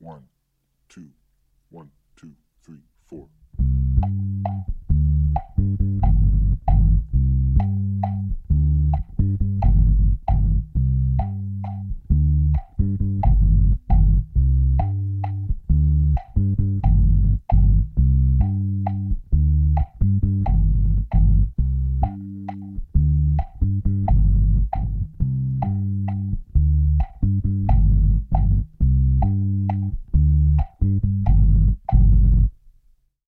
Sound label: bass mambo and son montuno style